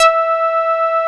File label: OB TITE E6; Drums In Your FACE!